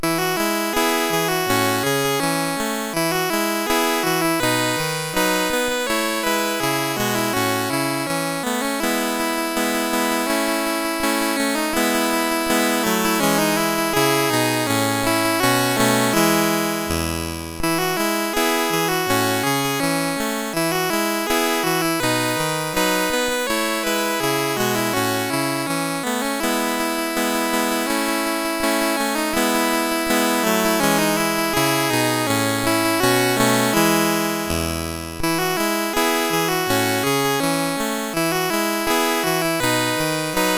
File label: MOS6581; MOS6581R2